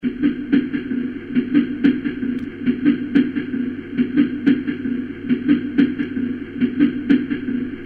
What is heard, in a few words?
Train